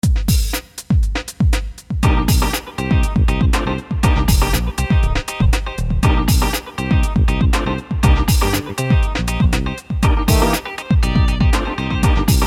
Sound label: equinox Funkey